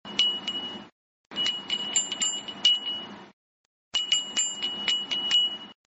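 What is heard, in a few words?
wind chime; huurin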